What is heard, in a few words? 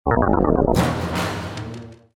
Hit; Tak; tak bites